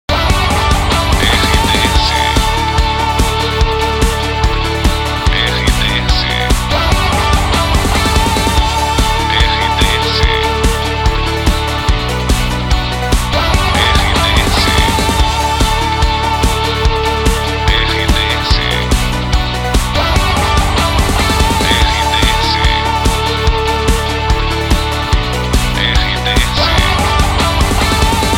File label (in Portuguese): trilha para locução; Onda Oeste